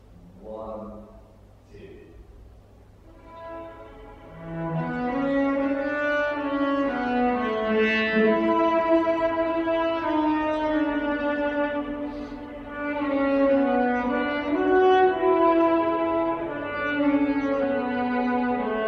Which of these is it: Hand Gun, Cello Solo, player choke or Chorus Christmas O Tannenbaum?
Cello Solo